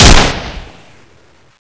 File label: uzi fire both; weapons; DarkCity